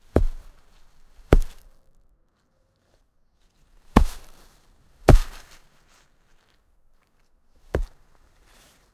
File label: rough sliding tackle sound, soccer